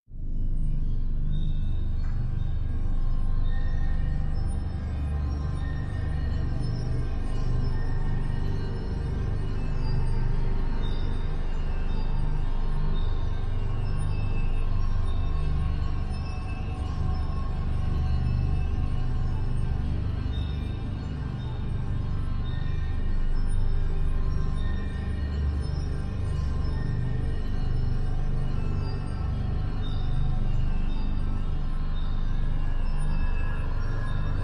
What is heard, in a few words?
Drones Only